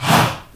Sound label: HA, SHOUT, Heart of Africa; Spectrasonics; Heart of Africa 1; CH. SHOUT1